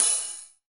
HH, Drum Mashines; EdgeSounds

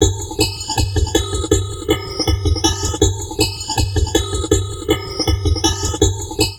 FLUID 160, Drum Loops Crazy Processed; USB Soundscan vol.07; AKAI

